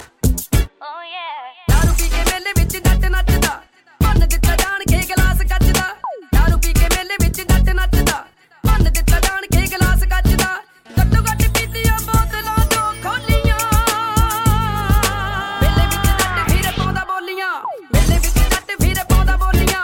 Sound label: Dj Song